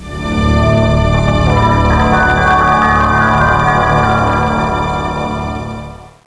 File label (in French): suspens